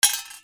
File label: MONEY